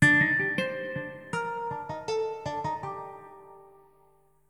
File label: ELECGUIT, AMBNT, 160, C; eJay; Ambient; Guitar